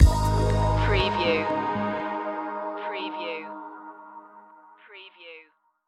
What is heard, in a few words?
aerial messages stinger